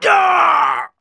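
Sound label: human middle male die, voice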